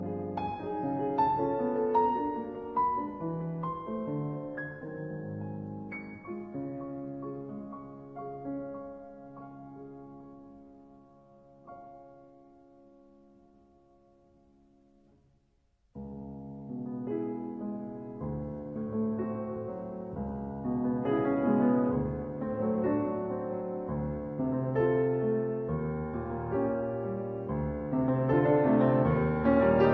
Preludes in D flat major Andante molto moderato; Op 103

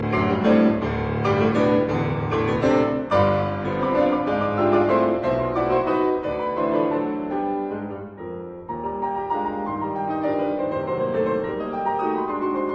Waltz in E flat major, Grande valse brillante; Chopin; Op 18

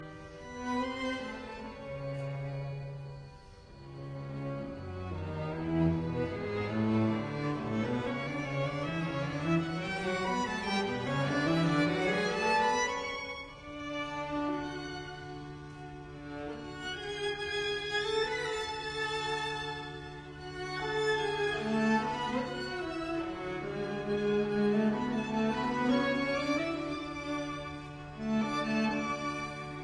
String Quartet Allegro calmo senza rigore; Britten; String Quartet No 2 in C major Op 36

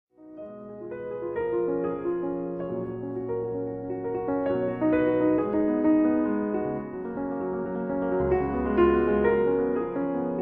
Impromptus for piano in G flat major Andante

